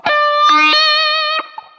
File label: wailer, guitar noizes